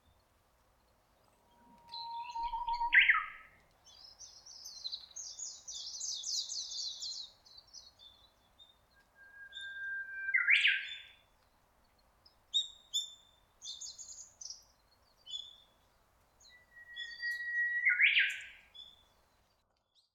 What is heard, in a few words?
RAW Decode NR